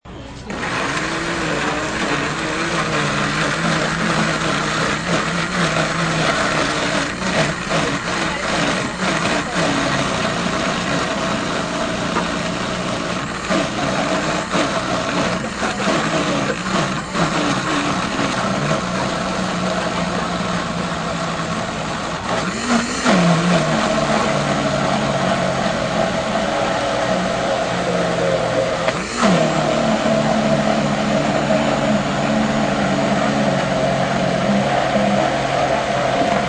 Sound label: blender